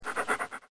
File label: dog